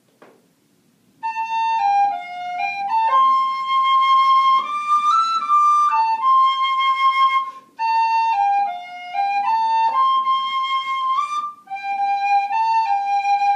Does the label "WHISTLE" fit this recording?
Yes